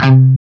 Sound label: CHUG C 2 R, Guitar; East West; Steve Stevens Guitar Samples Collection